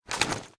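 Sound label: foley assault rifle akilo47 grenade launcher raise; weapons; viper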